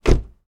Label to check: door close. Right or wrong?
Right